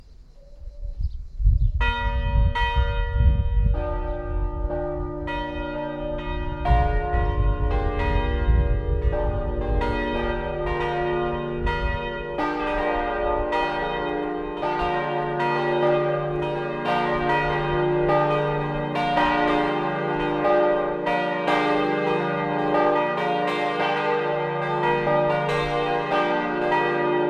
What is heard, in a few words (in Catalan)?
campana